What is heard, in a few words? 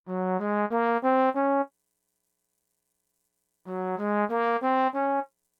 Trombone SD50 vs INTEGRA-7